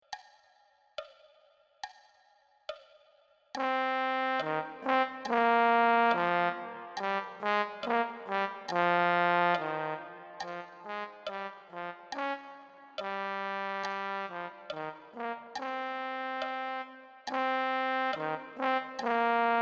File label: trio 70bpm